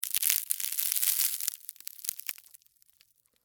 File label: Impact Wood Splinter, Small, Sticks, Tear, Long; BlastWave